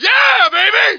yeah

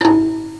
xylophone; Sound Effects for Multimedia - Volume 1

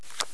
card

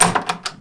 wood, doors; SINDEMO; environment

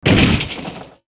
rock hit; planecr

